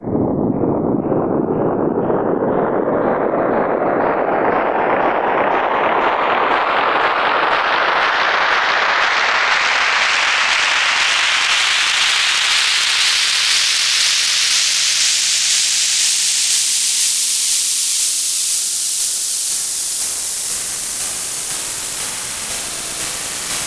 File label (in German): applause tonleiter